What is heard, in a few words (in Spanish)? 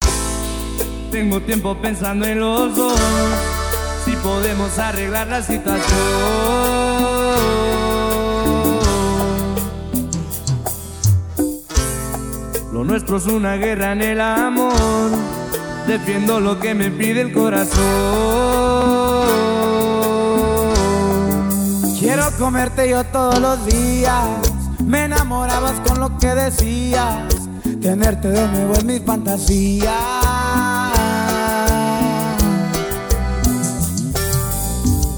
CUMBIA NORTENA